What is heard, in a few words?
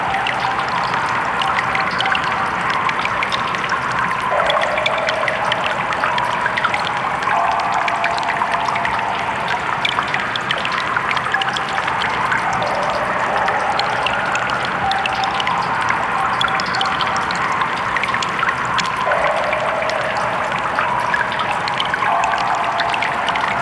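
Cave T Junction Ambience Loop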